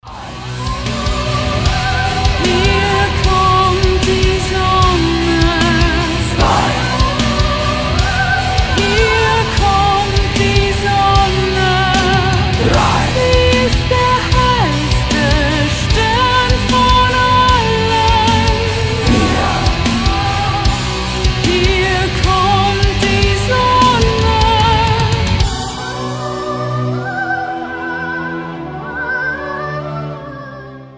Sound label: female male cover